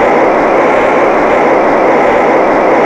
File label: scrub dry, Tires; Kart